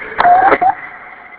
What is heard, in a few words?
sms